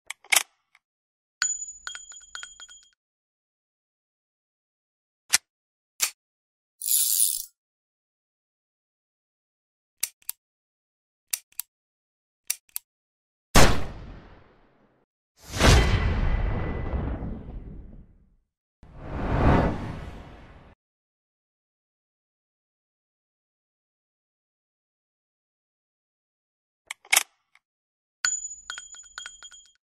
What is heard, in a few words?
Russian Roulette